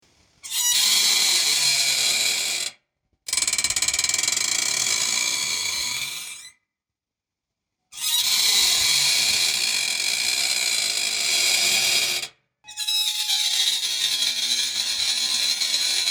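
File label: iron gate creak, metal grinding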